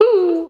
"hoo", ooh ahh; Voice

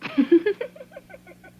laugh

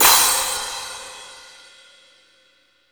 Cymbals

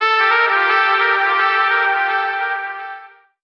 sax stab

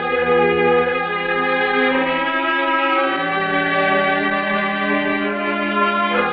strings, Sad Loops

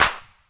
clamps, Clap n Snap; Snares

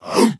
"uhooh", voice; ooh ahh

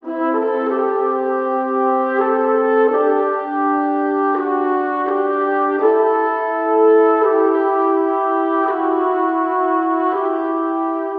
horns strings, jazz loops

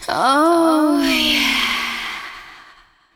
female orgasm

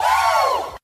"wow" crowd; ooh ahh

